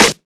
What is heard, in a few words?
noisy acoustic snare sample a sharp key